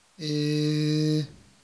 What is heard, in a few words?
Vowel Sounds Eb